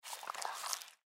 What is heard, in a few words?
Panther Chew Eat Single Bite; Leopard Sound Effects; Animal